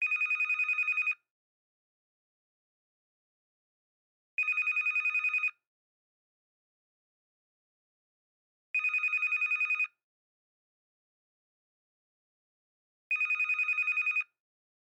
Hotel Telephone Ringing With Digital High Low Alternation